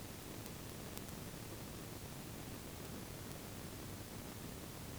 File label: PassiveSensor 384kHz, passive acoustic sensor; Alykes